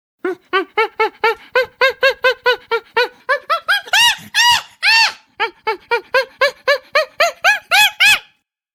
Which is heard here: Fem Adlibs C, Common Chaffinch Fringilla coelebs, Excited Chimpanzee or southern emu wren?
Excited Chimpanzee